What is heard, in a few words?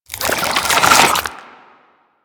Infested Weakpoint Retract; Warframe